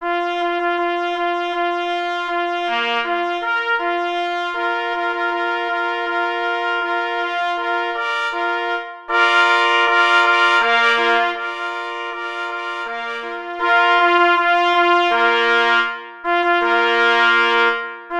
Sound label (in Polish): na 3 plesy; H. Maka; Chwal Cwik